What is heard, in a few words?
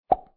pop